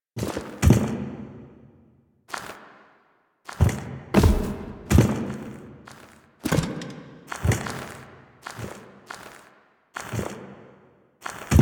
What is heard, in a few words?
Foley; Warframe; The New War; Finale